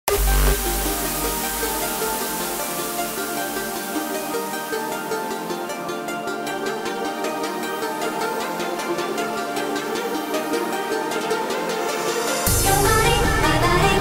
hardstyle remix

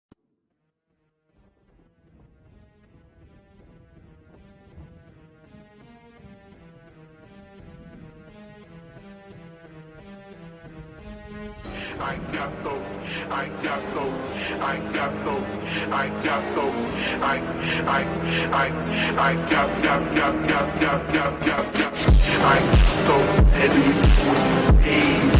slowed reverb

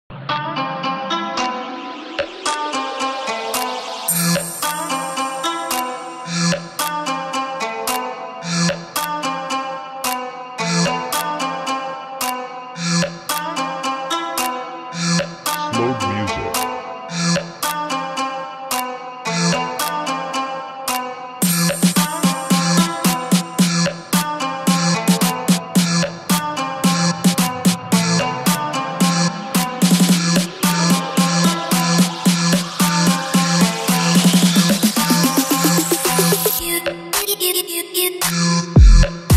slowed music

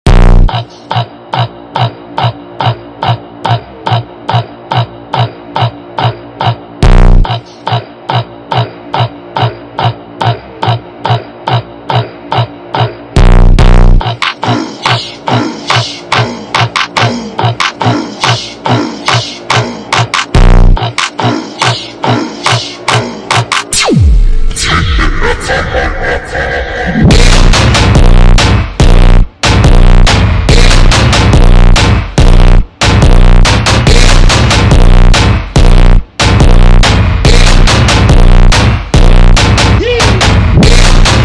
ultra slowed reverb

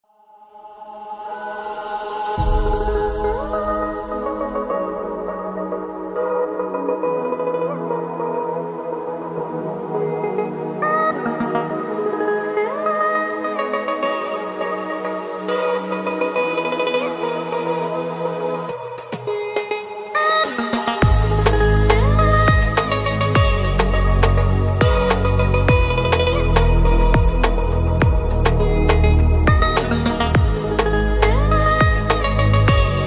lofi chill remix; Anime; No Copyright Music

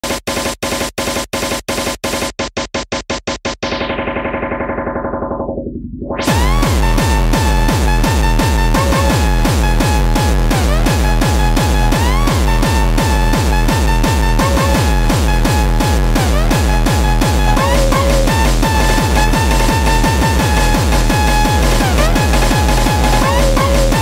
hardstyle; cry of fear

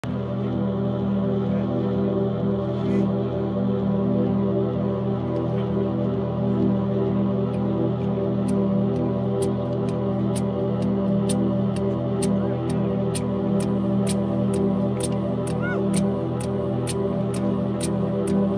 live looping techno, insane crowd energy; in berlin

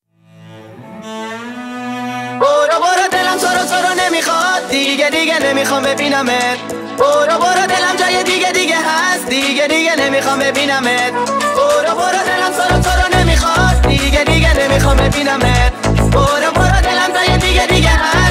remix car music

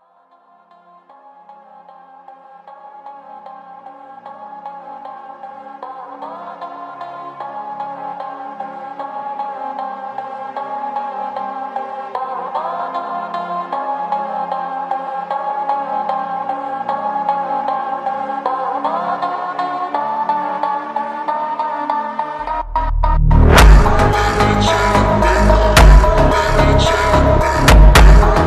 funk slowed; tik tok

